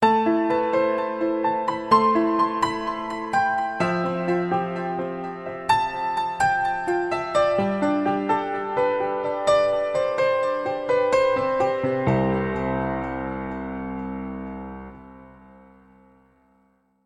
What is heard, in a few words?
Vintage Grand Untuned Child